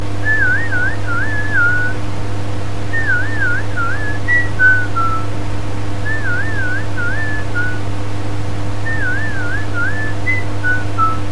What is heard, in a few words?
puffball dream song